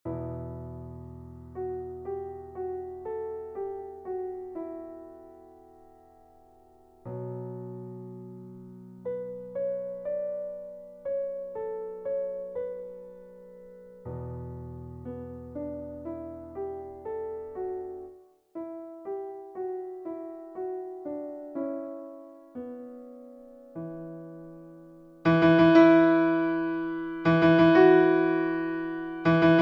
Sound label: Theme For EASY Piano Beginner Solo